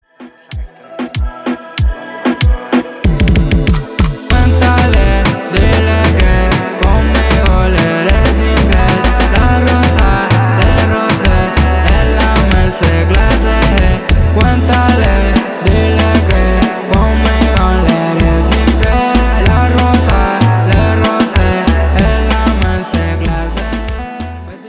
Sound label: Intro Verso 95Bpm